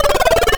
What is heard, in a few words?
Wormie Birds; Slime-san 2017 pc gamerip